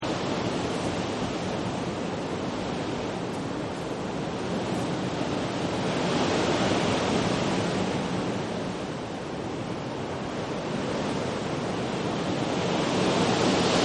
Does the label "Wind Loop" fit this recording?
Yes